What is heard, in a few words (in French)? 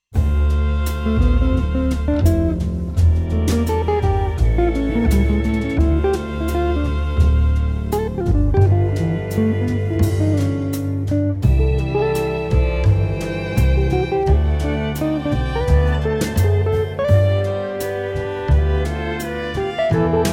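Sérénité, Les émotions; Sérénité 2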